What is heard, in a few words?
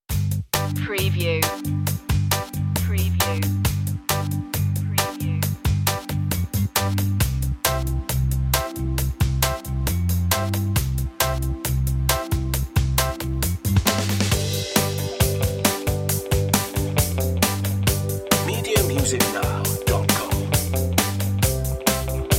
gentle beat